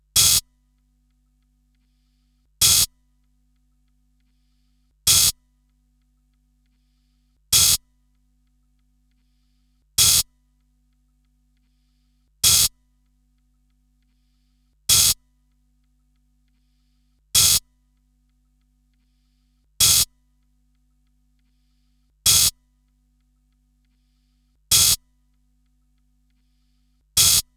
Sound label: highhat, techno; techno 2000